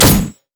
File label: rampage; LoL; hecarim